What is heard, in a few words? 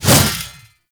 Mace Whoosh; Warframe; Duviri; Dax; Mace And Shield